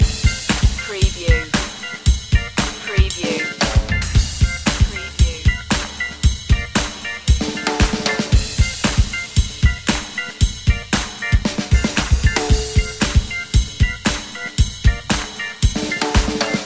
the funky two loop

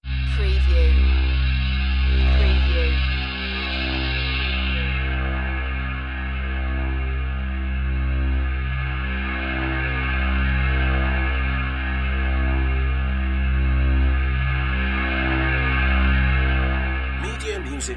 Noiz pad

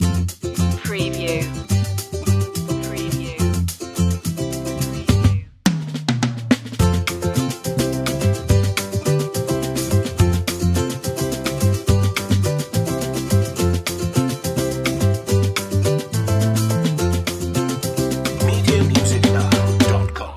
easy life loop

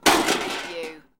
Metal Debris Impact Settle

